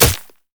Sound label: basic attacks; Zyra